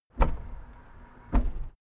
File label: car lift down